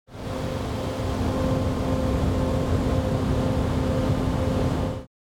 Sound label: battery cooling